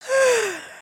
yawn

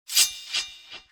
Battle Area Scrape; gnarled forest

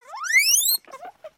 Pet Bunny Beg; creatures pets; Lego

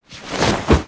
backpack cloth; aronwk collection; ndaudio

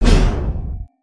carrot slash; weapons; aronwk collection; ndaudio

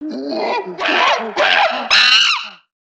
monkey; ambience; avant gardens

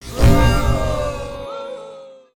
MINIGAME FAIL

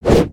Mole Whacker Swing

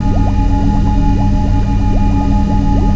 Pipe; Imagination; ambience nexus tower

